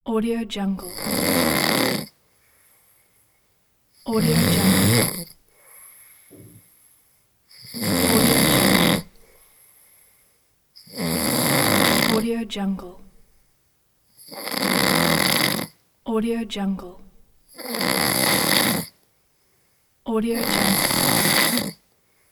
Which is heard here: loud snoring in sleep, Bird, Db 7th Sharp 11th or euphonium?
loud snoring in sleep